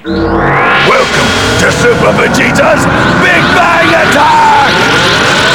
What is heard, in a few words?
powerup; shmod; vegetto